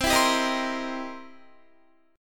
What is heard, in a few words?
C 7th Sharp 9th C Guitar; C7#9 strummed; JGuitar